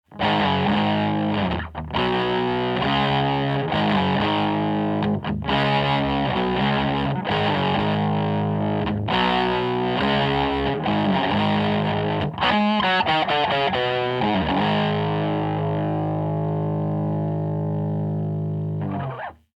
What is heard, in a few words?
Phase EQ Submix C414 SM57